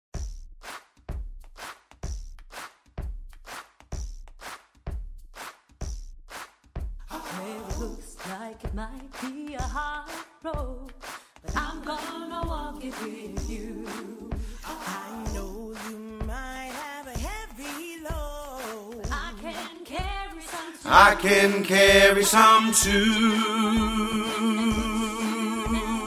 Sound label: I'm Gonna Walk It With You, Tenor